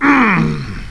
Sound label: alpha pain; paranoia